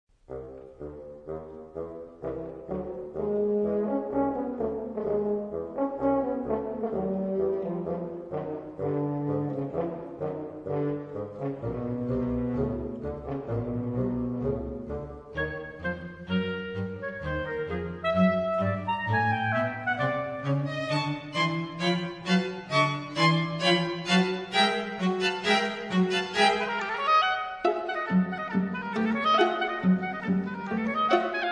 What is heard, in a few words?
concertino oboe